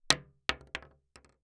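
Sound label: Weapon Bullet Shell 12 gauge Drop On Hollow Wood; AdobeCC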